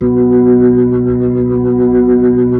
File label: B-3